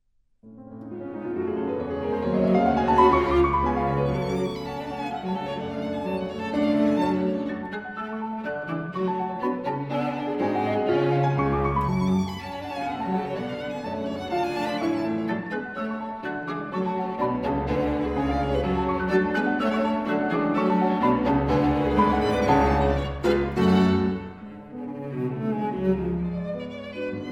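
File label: piano trio; barnekow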